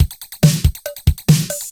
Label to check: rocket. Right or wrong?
Wrong